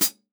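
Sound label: Acoustic Hi-Hat foot; Roland SPD-S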